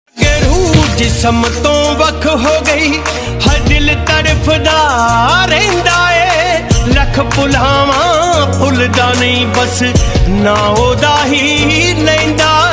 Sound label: Punjabi Beats Ringtone